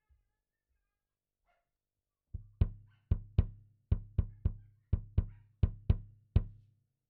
Kick

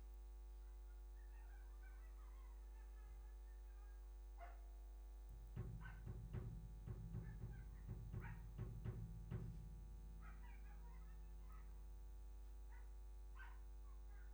Bass DI

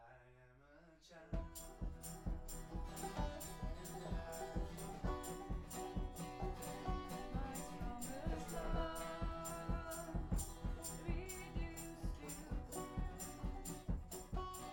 Voc Fig8

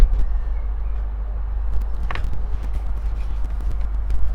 Voc Omni

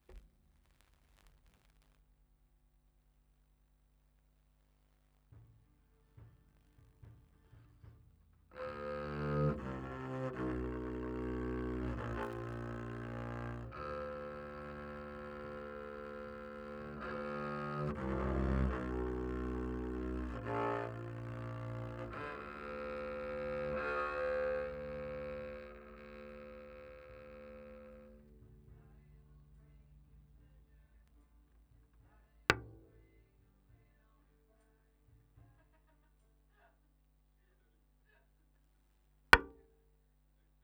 Bass DI

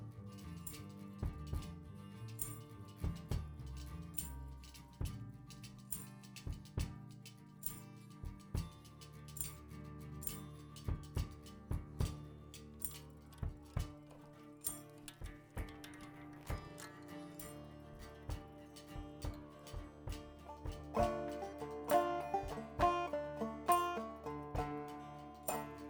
Banjo

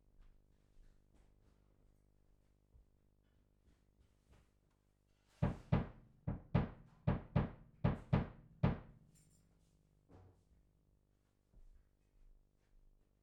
Bass Mic

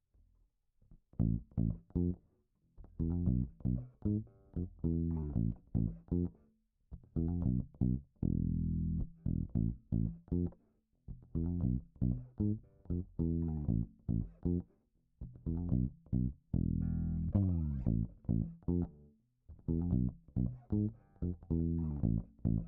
Bass FX; EamonnTobin

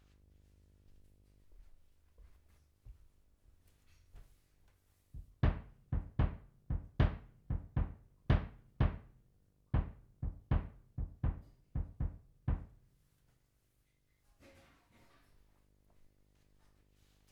Banjo

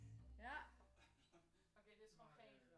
Banjo

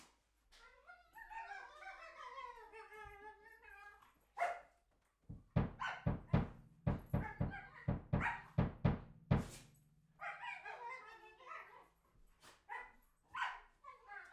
Bass Mic

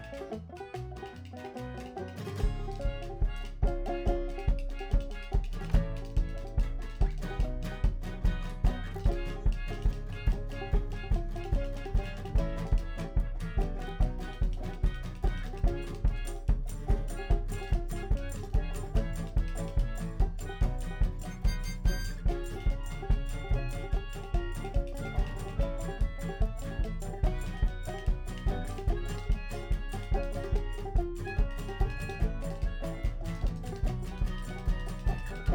Chordz

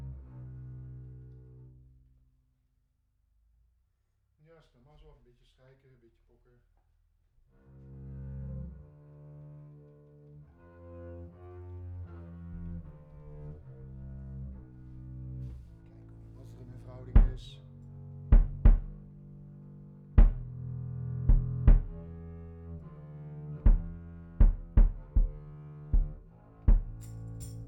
Chordz

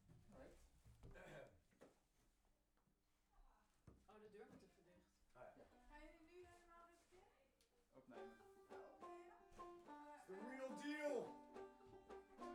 Bass Mic

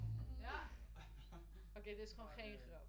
Voc Omni

